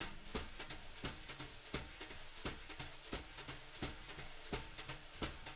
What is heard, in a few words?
BRUSH LOOPS, Living Drums; Sampleheads; Peter Erskine